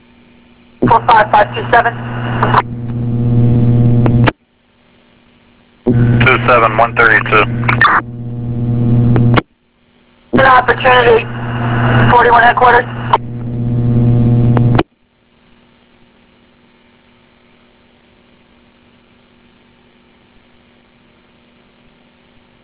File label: crash